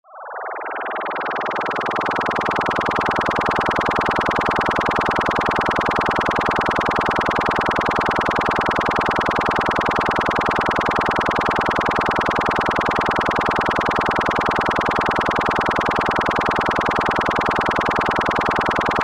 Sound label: Sci Fi Laser Ray Energy; Blastwave